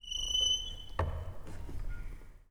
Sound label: Wooden Door Creak; 99Sounds; The Warehouse